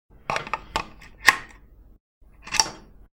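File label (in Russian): Штепсель розетка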